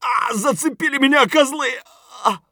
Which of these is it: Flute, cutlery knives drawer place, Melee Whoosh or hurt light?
hurt light